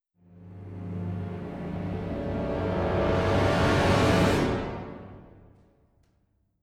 orchestra swell; Netease; gank

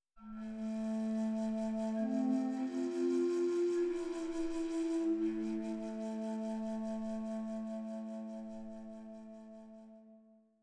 bass flute; combat results lose; Netease

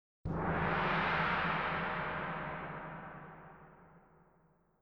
gong; Netease

